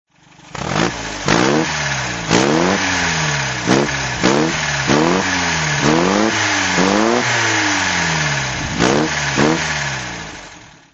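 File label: mgtf throttle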